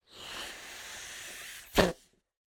cartoon whoopee cushion blow up slight air relase at end